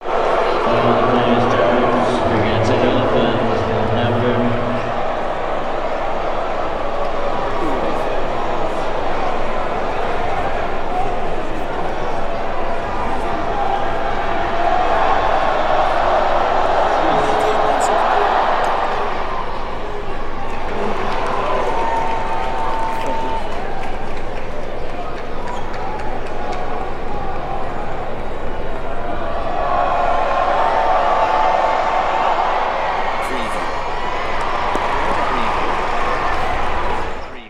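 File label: Foul Shot Cheer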